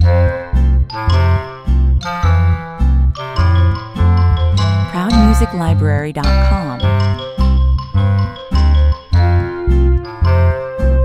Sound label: This is a quirky, cheeky and fun track. Bass clarinet, flute, simple strummed guitar, 105 bpm